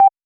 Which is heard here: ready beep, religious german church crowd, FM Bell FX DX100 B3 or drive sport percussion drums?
ready beep